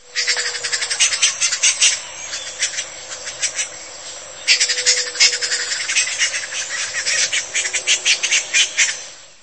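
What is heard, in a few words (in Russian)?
взволнованное стрекотание озабоченной пташки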